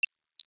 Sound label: Space MMC removal, Nokia; audio themes